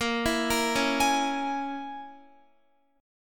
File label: Bb Minor 7th Flat 5th, Guitar; Bbm7b5 arpeggiated